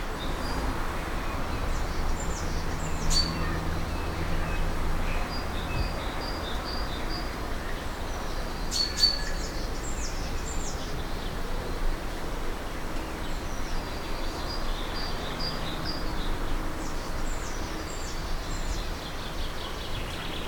Amb Air Oiseaux Jardin; French

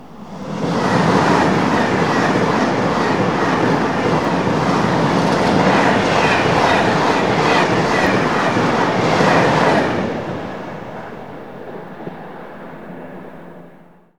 Pass Tgv Long Proche; French

